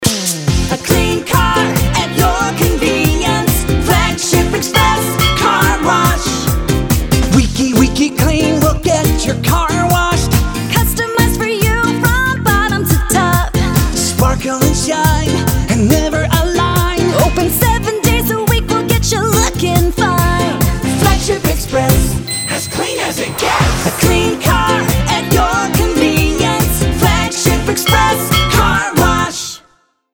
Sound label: Car Wash Full Sing; Flagship Express Car Wash; car wash jingles